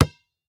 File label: ball shits; FootgolfEvolution